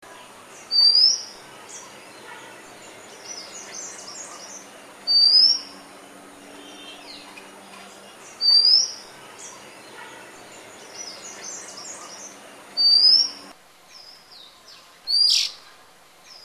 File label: Oriental Magpie Robin; Sri Lanka